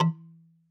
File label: marimba kalimba; ゆめ2っき